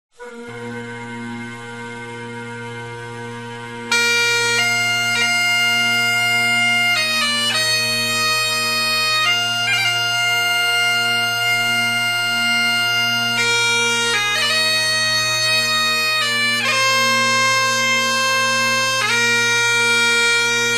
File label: Kilted Music; HIGHLANDER